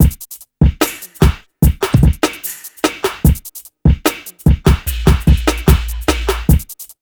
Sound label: BEAT, Hip Hop R n B; USB Soundscan vol.19; AKAI